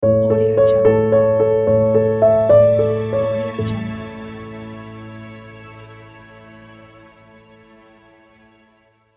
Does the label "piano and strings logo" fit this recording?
Yes